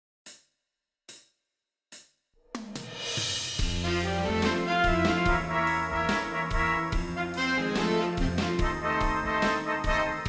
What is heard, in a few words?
Descending Intervals